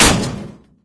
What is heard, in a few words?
sentry upgrading, weapons; tf